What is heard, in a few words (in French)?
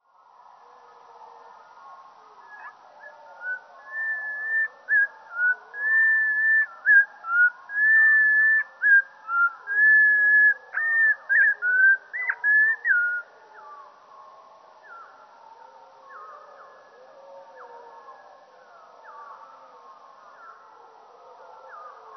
ROITELET HUPPE MOINS DEUX STEREO